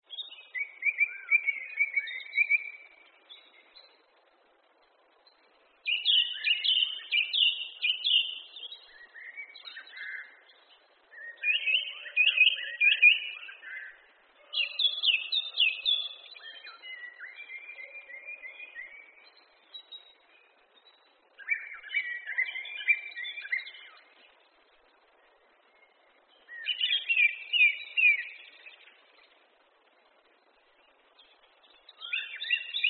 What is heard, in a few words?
Bird song